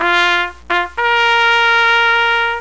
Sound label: taps